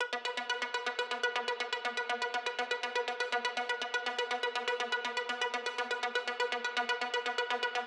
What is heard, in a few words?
Arpeggiator Loop